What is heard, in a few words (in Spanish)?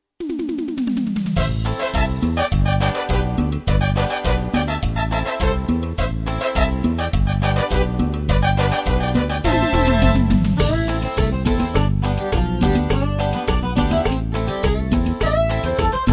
Cumbia